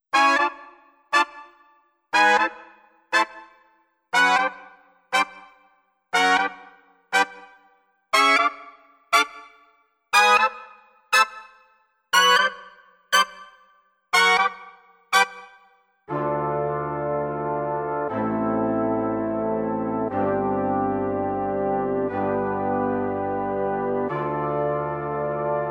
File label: brass